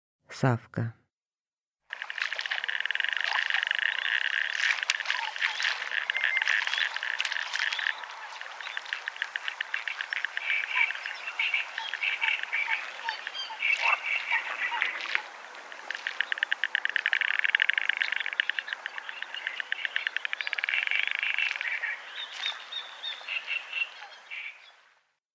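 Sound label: white-headed duck voice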